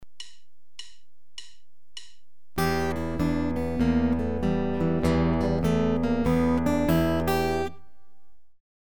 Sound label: Steady bass notes with the E blues scale, guitar